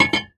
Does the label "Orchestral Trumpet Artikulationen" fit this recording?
No